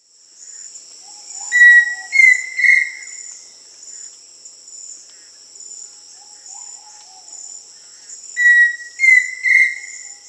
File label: Pheasant Cuckoo (Dromococcyx phasianellus), Peru; S. America